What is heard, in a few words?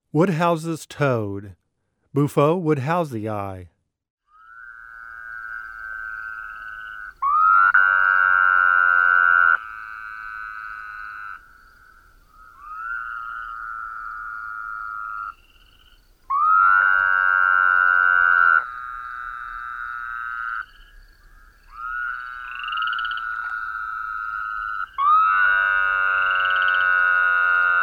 Woodhouses Toad; Frogs Toads North America; Frogs Toads North America Identification